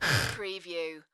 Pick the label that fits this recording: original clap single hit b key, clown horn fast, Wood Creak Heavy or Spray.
Wood Creak Heavy